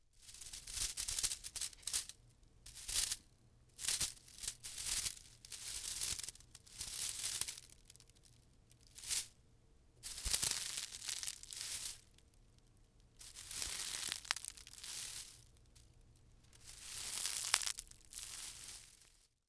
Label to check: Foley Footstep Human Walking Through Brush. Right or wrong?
Right